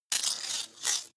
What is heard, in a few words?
metallic rattle, robot; comedy cartoon